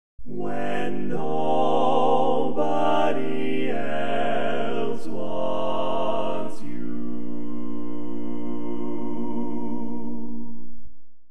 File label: Bass